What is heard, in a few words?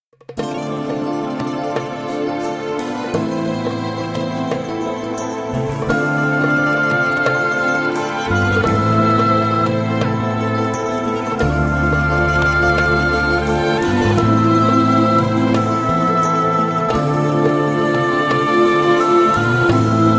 Vocals; Heilige Stadt; Schulte Gerth Studio